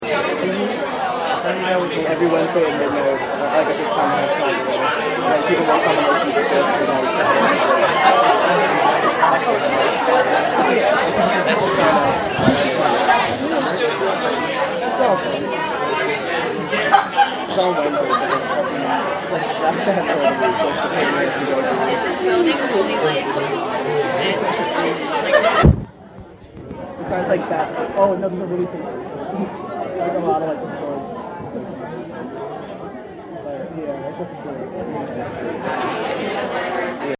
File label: After Midnight Childrens Show, Laughing